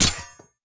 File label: katar